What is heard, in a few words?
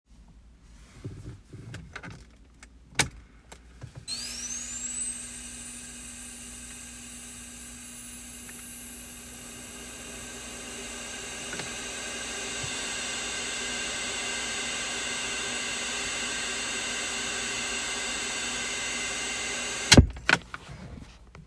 hiss